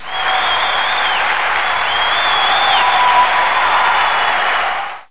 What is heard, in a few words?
Crowd